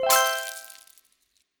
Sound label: bar filled; Skillzgaming; gems odyssey 92 desktop